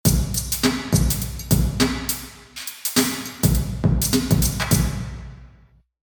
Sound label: church drum; saint laurentius; molenbeek bekkevoort belgium